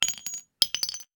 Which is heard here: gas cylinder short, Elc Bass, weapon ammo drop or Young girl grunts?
weapon ammo drop